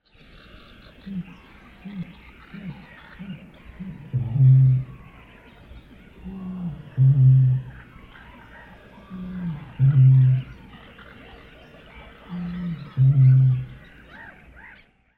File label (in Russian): snachala y y potom uhaet